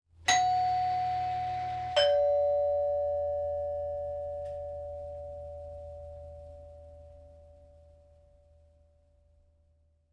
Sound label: Doorbell